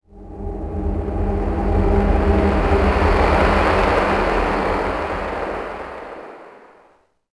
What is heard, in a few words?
City Flyby Small; Ambient; Sid Meier's Civilization 4 Complete; Beyond the Sword